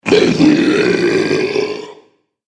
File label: Alien Ogre; Alien Sound Effects